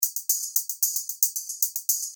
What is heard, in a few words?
hihat; Multitrack